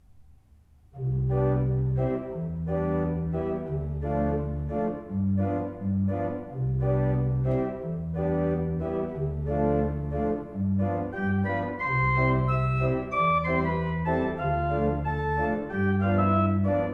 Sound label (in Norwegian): brudemarsj, kirke; herøy-nordland; buvik kirke; skaun; brudemarsjer